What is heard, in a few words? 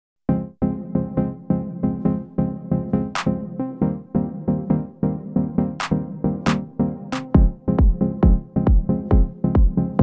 house mix